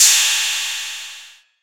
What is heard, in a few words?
Perc